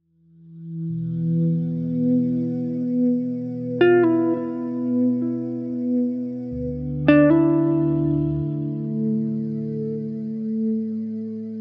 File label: Guitar Synth Drone